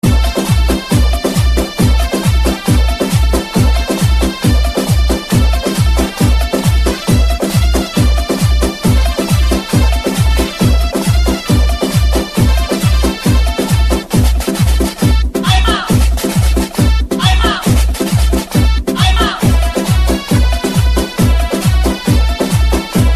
Bass

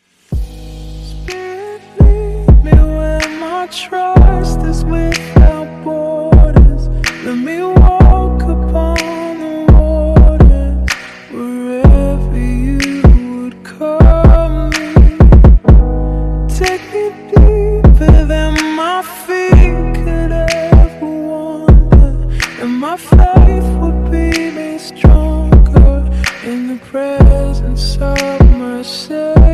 REMIX

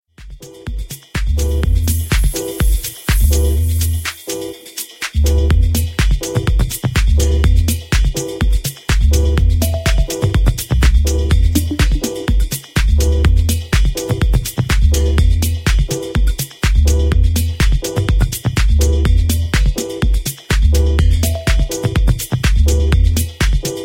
Exotic Gamelan

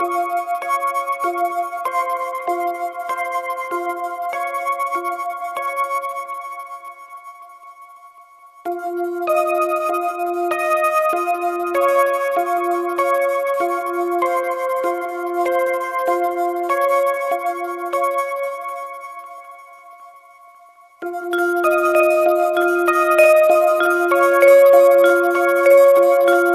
Nokia 8800; Wake Up

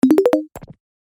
Tink

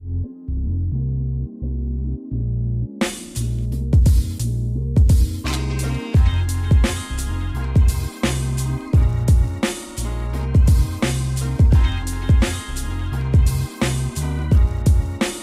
Old School Hip Hop

